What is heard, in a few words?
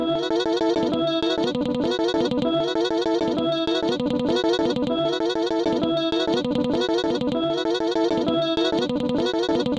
crazy guitar; Polyend; Loops n trouble